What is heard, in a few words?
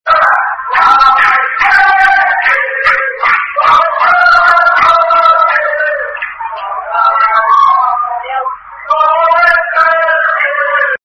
Following Crusaders Football Chant (Soccer Song)